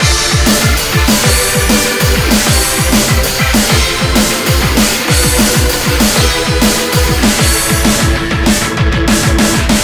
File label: Login; beatmania IIDX 25 CANNON BALLERS